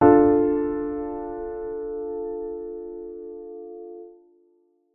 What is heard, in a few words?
minor triad blocked d